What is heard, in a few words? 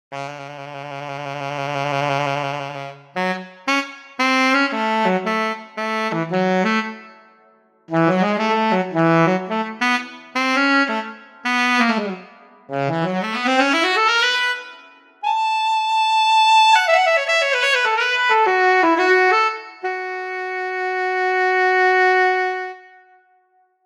alto sax; vsl